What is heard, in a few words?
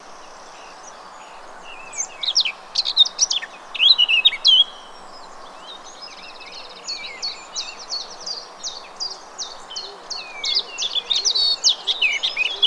Blackcap eq and normalised